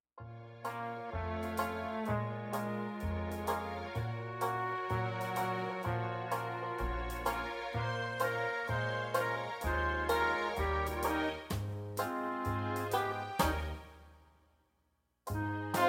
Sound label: starting in A flat